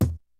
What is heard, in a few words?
KICK SNARE; Kid Nepro; Elite; KICKS SNARES